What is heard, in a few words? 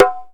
DH, Indian Percussion Series Tabla; Mango Loops